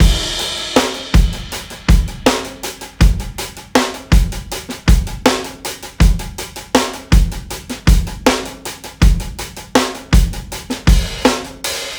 ROOMY BEAT, breaks, drums; Basement drums vol 2

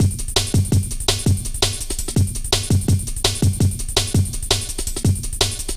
Drum Loops, Jungle

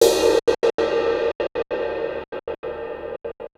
Gated Cymbal; musicradar

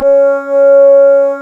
C#, Synclav FM, WIND; Big Fish Audio

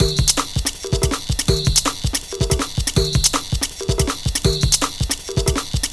162, breaks, Loops; M8

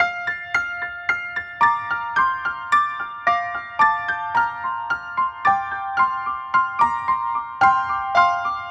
Chime Piano, Trip-Hop Loops; Sonic Foundry (Sony Creative Software); Rondo Brothers Trip-Hop Loops & Samples; Apologists

